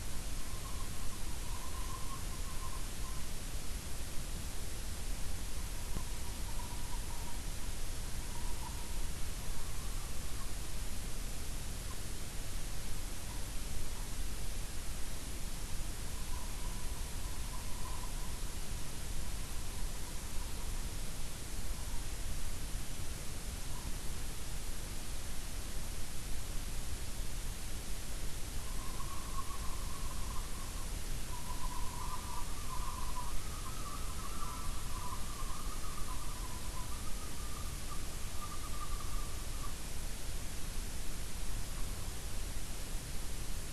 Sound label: WFR audio emfisis